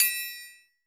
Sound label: triangle; Greytsounds; Scott Peers Sound Engineering